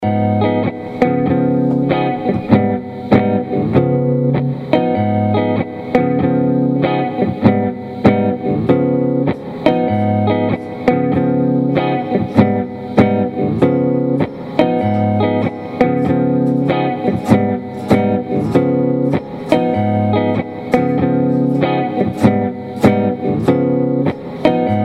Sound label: melodic minor mode IV play along, guitar